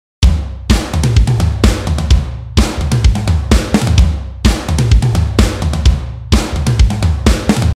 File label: 128 POP PUNK LOOP AGGRESSIVE TOMS